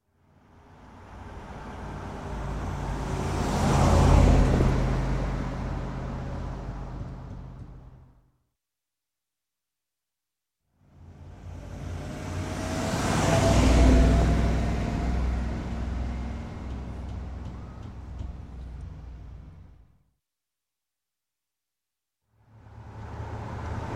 Pontiac Sports Car x 3; Sound Ideas; Series 2000

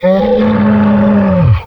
MONSTER Groan Long; MONSTERS & CREATURES; mono; Universal Sound FX

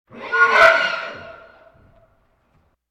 Animal effects snorts grunts farm ambience

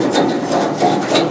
slide Noise Heater; blindside

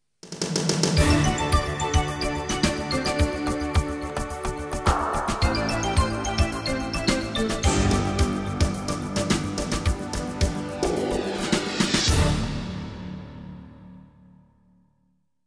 jingles; 90 DDD Jingles

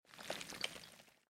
Boulders; Blind Quest - The Frost Demon

